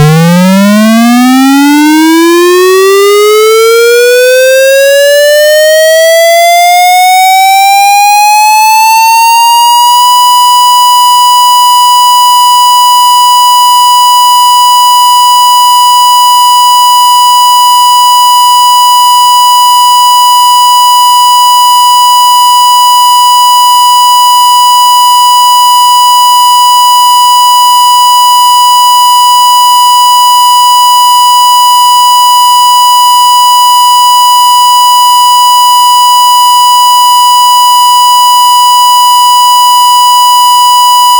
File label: Siren German